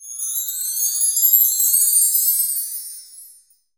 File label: wind chime